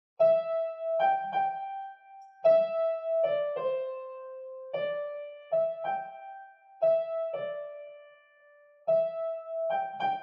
Piano

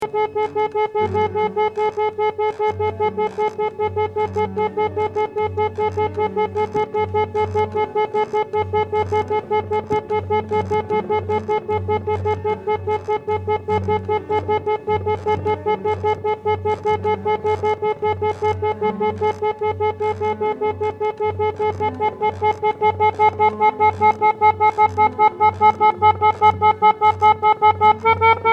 keys

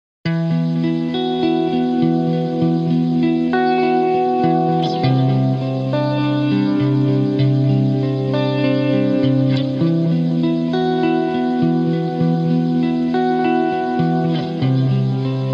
Guitar Shimmer

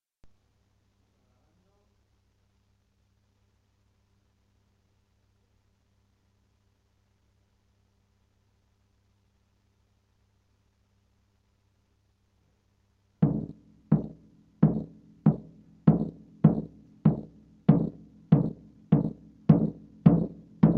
Bassdrum

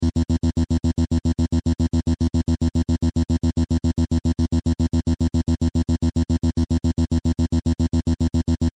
synth bass

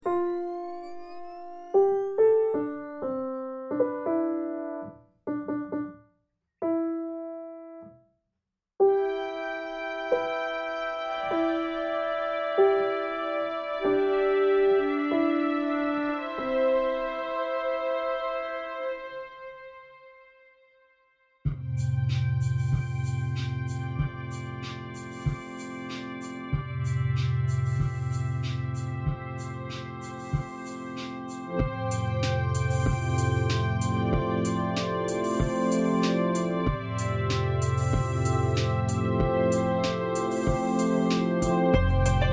ambient tune

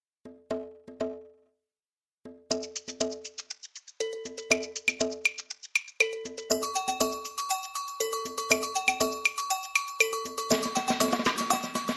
Batucada mix

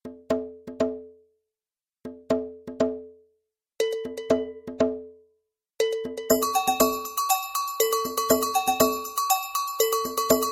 Batucada

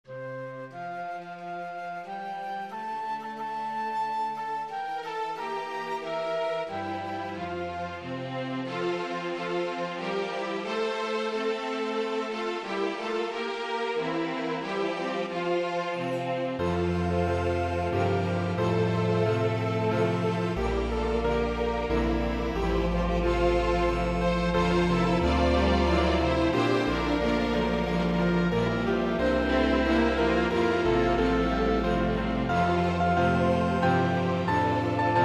Medley

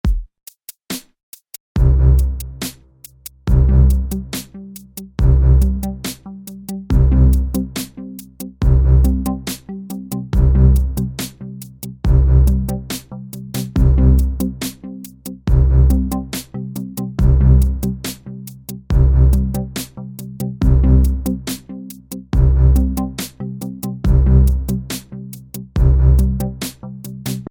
trance

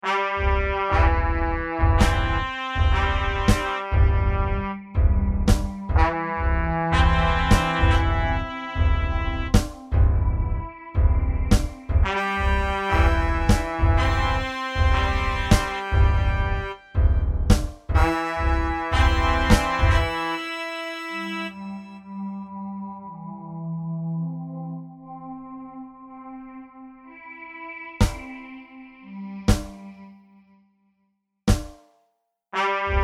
fanfair for music ocasions

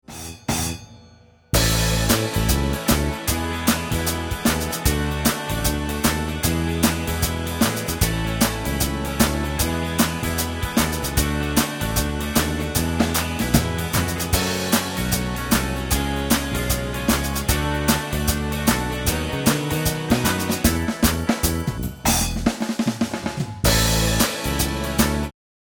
popsong drums + keyb Guitar

